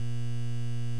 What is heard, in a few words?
ambient lamp; chaoscity